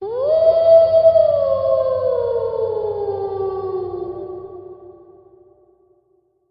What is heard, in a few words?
Werewolf Howling